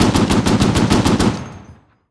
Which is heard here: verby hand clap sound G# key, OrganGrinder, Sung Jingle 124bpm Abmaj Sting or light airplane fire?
light airplane fire